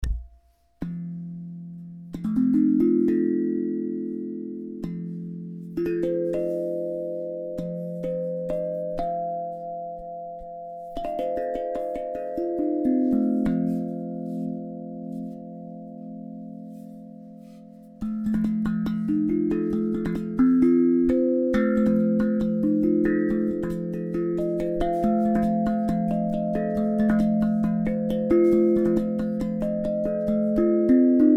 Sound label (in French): Mains